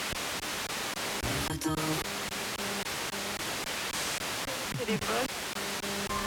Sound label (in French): photos telephone